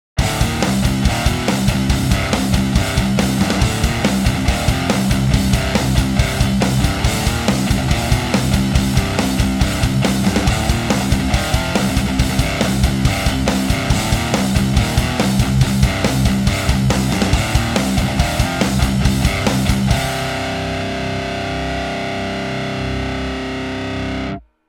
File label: METAL high gain; Axiom; Hans Metal Pack